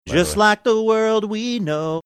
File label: singing just like world we know